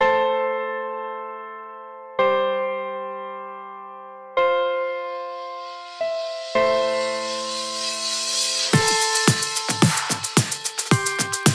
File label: Bird Bass